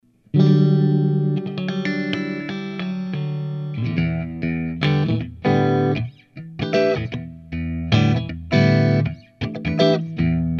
MC3 clean

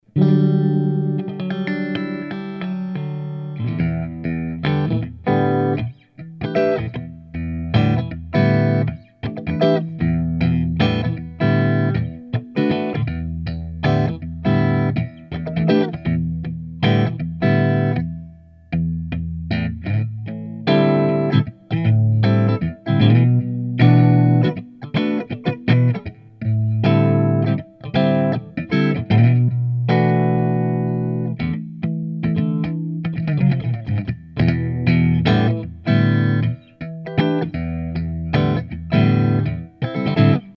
clean

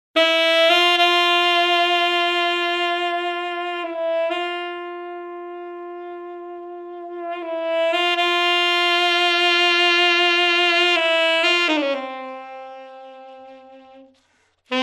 sax

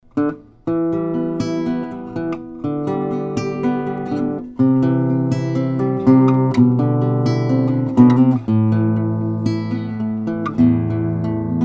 cheap guitar; SB Right MA left

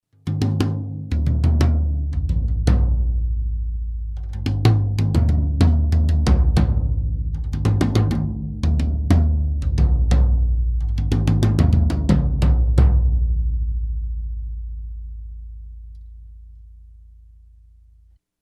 toms bundles

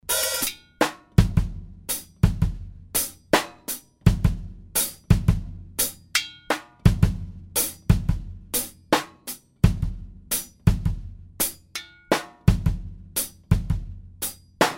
drums above snare

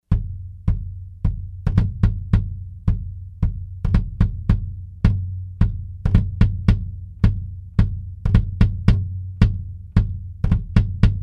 at sound hole of bass drum

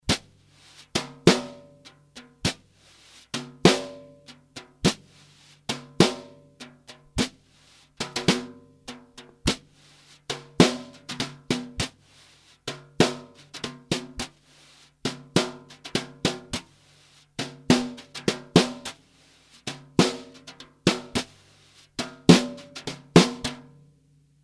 pearl Snare brushes

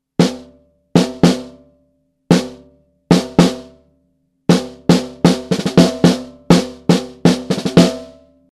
top bot snare

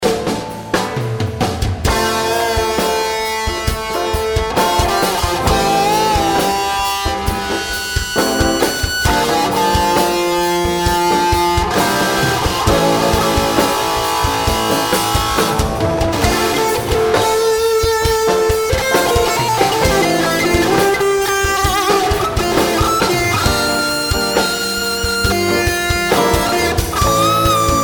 Chainsaw solo

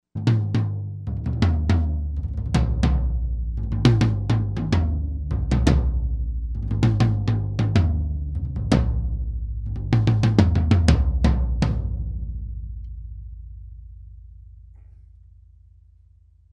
toms sticks

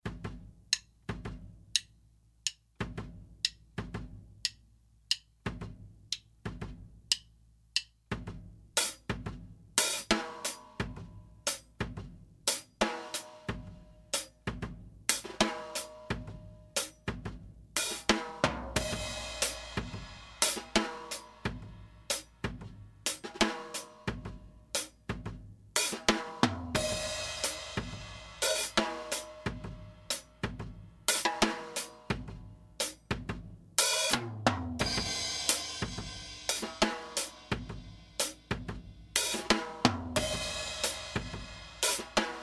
overhead

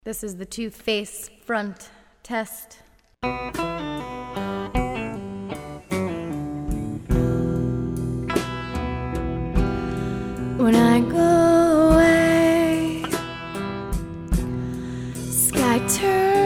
2face front; Cameo

